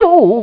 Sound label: hit; BattleSNDS